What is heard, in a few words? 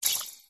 negative touch sound fx arcade casino kids mobile app